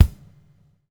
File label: BUBL, Backbeat; Spectrasonics - Backbeat